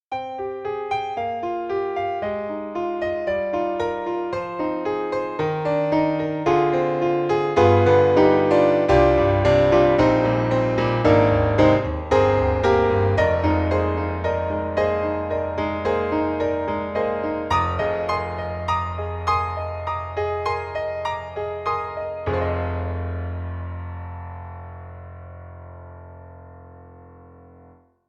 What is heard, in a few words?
Mini Grand, Bright Pop Piano; AIR Music Technology